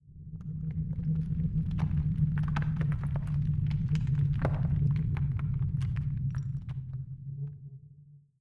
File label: wind canyon; Windy Canyon